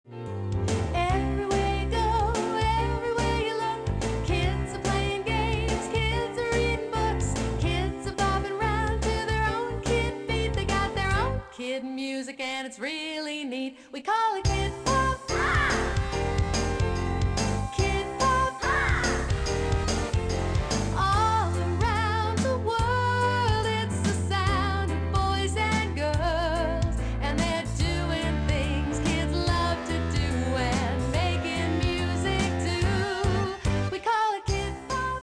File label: KidPop